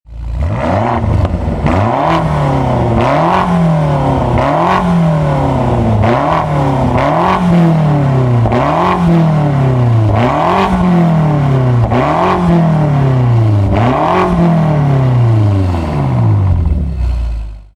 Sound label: G90 revs, bmw m5 g90; autoid build midnight purple